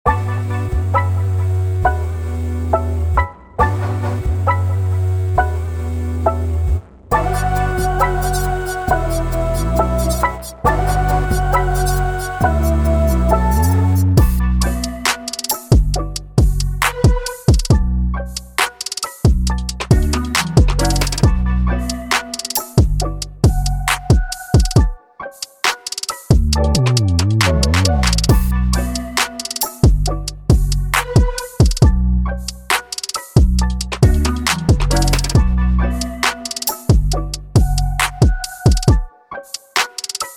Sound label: Trap Rap